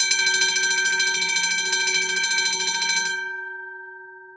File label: tram bell; best service Blue Box